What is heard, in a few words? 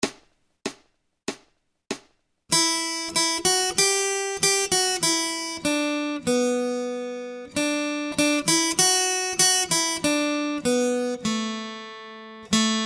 Acoustic Accompaniment, acoustic guitar